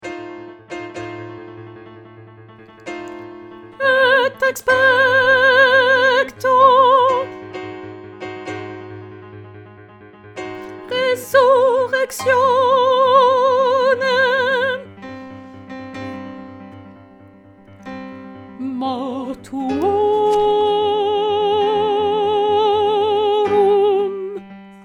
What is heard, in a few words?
soprano Credo